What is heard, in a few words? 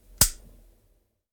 Light Switch; The 39 Steps